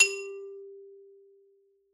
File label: Xylo Hard G3 ff far, Hard Mallets; Idiophones; Struck Idiophones; Xylophone